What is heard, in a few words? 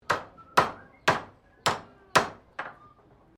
Blacksmith Hammering Sequence; Colonial era objects; Hammers (Sound Effects:Tools)